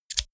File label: single scissors